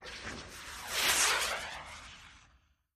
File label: Rocket Flare Gun Fire, small rockets; Rocket Flare Gun: Fire By. Stereo